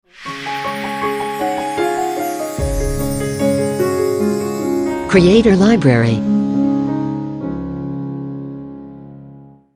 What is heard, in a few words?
Elegant Logo; Logo-Idents